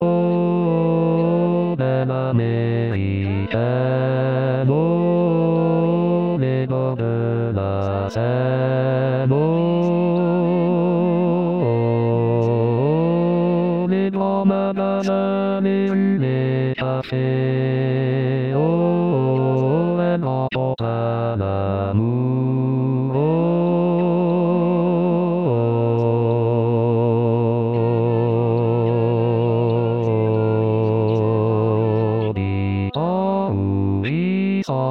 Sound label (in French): basse